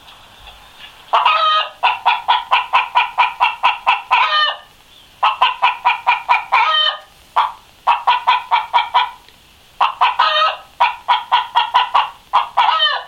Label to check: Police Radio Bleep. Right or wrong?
Wrong